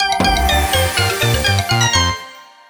win; Amatic; Chinese Spider

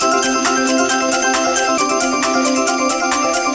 count up; Amatic; Dragons Pearl

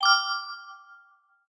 count up end; Amatic; Book of Fruits

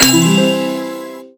bonus chance; Amatic; Grand X

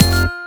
stop bonus; Amatic; Fruit Box

